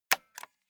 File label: stapler staple loose